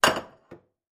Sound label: dish on counter, glass